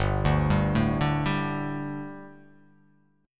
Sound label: G Suspended 2nd G, down, Gsus2 Chord; arpeggiated; JGuitar